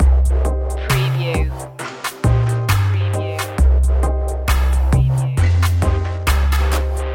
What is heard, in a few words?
street gang loop